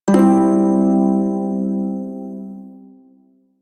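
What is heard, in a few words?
Prime Shawzin Pentatonic Major Chord G; Music; Warframe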